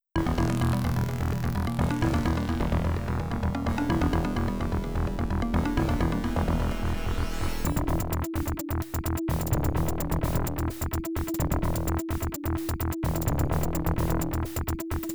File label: Intro Sound Blaster; dos games; Descent; dosdays